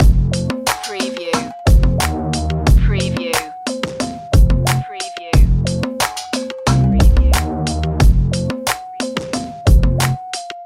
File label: bass loop